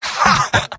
scout laugh short; vo; tf2; mvm

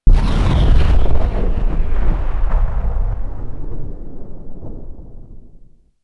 explosion petrol medium, bomb; gbombs 5

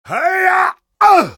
heavy taunt head exert; vo; taunts; tf2

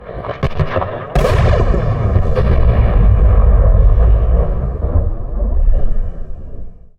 thunder, halloween ambient; Garry's Mod; tf2

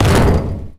sentry buster step; Garry's Mod; tf2; mvm

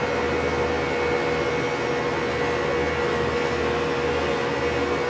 machine hum; Garry's Mod; tf2

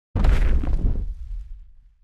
step, mech; lin gang; adan mech

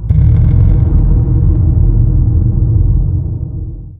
mysterious perc, halloween ambient

